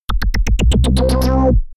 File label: ticking raise